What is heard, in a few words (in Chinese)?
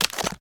嘎枝脚步声